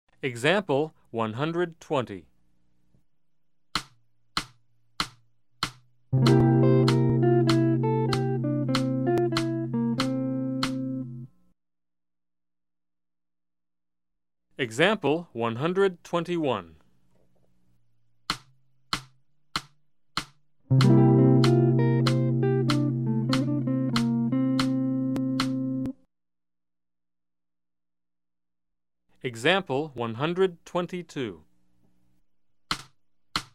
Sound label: Jazz